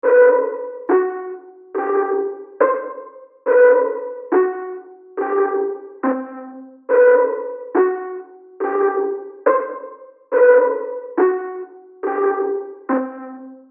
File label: LOOP Bm 140bpm; Unison Artist Series Essentials